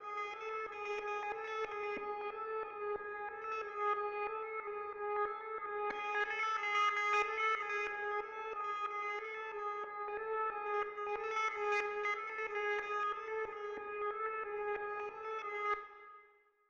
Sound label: 122 BPM C# Min Error Pad, Loop Stems; Melodies; Cymatics; 2022 Melody Mystery Expansion; Chain